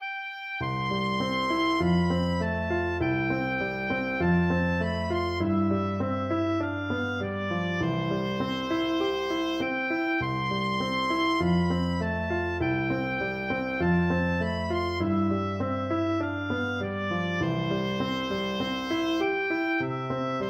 blue bells scotland, oboe

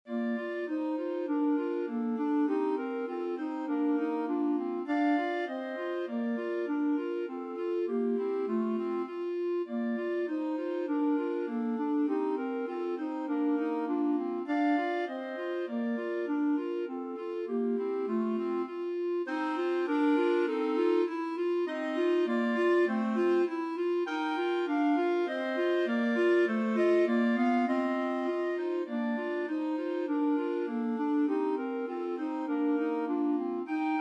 clarinet trio; schumann

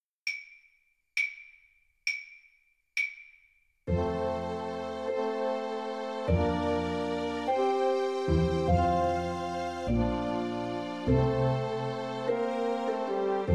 ukulele

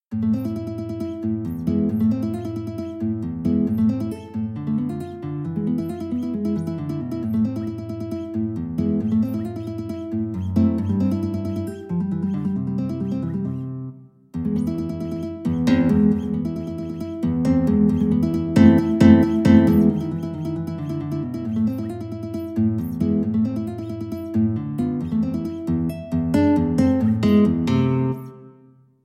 Etude in A minor, guitar; mertz

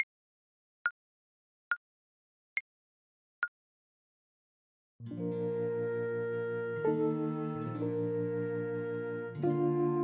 Clarinet

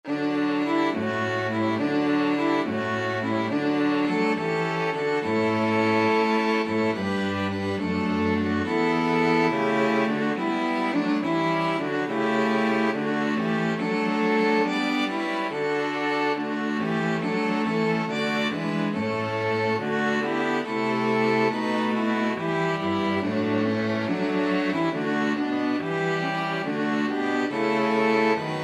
wassailing STRQ, string quartet

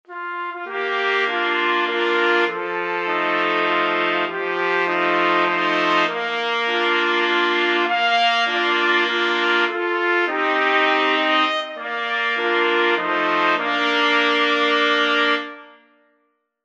trumpet quartet

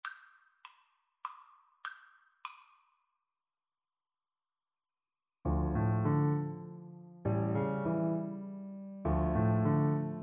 Double Bass

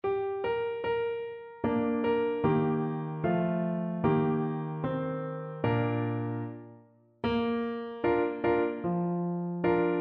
Clarinet

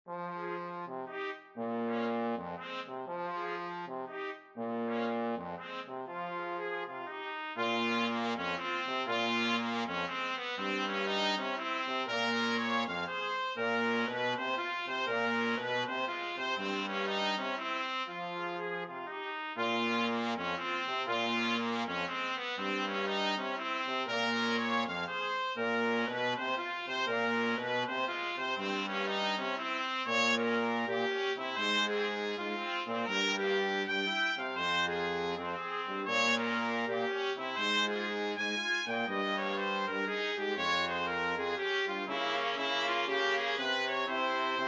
2 trumpets trombone

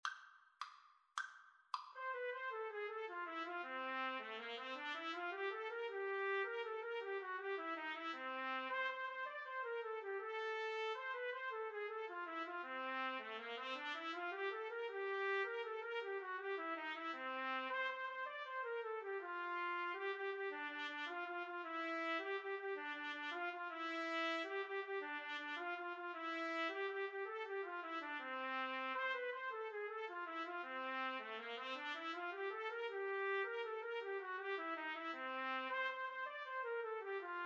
trumpet duet

